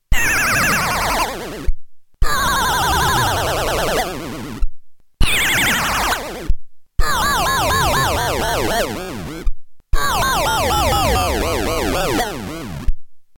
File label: vibrato cat; GreenBox